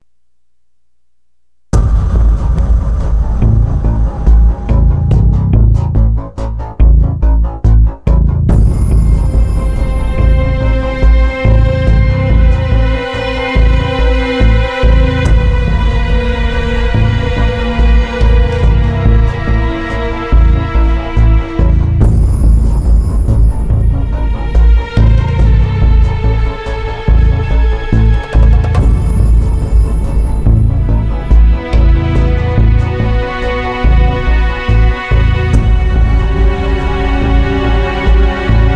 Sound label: caves stealth; Call of Duty Mw2 2009; game